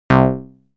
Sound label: Multiwave Lead, synthlead